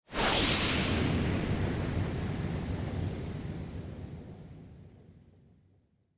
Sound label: Motion Speed Lines Low Long; モーション スピード線; 低 長